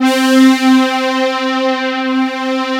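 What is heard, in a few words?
analog pad C4; ANALOGY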